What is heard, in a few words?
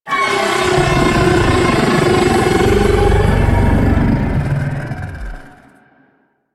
Arachnoid Camper Vocal Attack; Warframe; Corpus